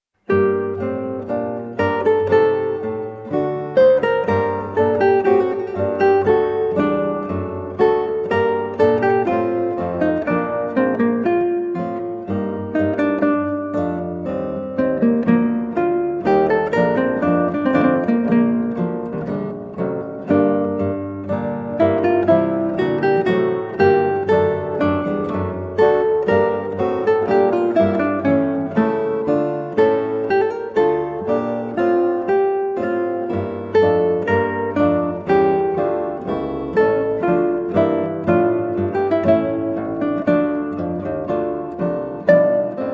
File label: Larghetto, Guitar; Recorder Sonata in G Minor HWV 360; George Frideric Handel